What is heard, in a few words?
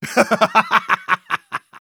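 laugh happy, German; Engineer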